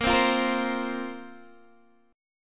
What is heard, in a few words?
A sharp Suspended 2nd A sharp, Guitar, Open D